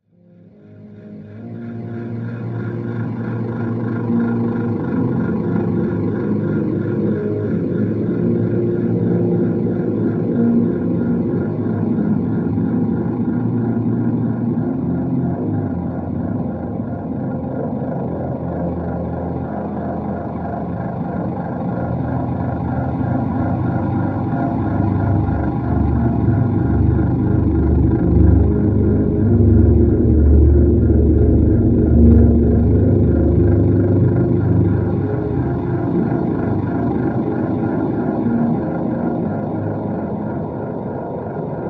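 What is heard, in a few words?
Drone Locust Nest Simple Low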